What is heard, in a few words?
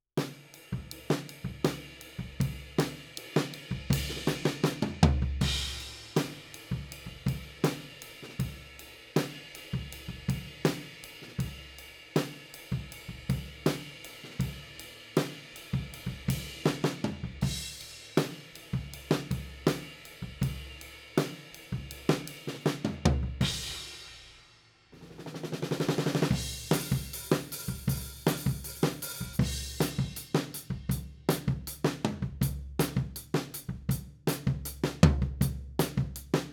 Floor, 160 BPM